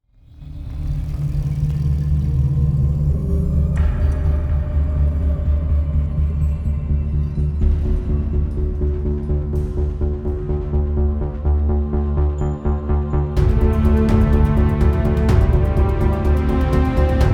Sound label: bgm